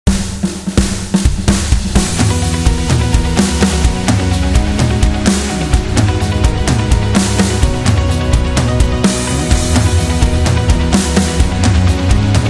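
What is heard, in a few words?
House Viewing, motivational corporate music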